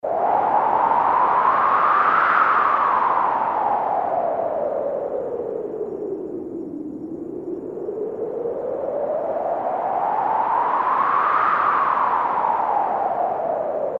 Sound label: Blizzard, Roland Juno-106; Factory Preset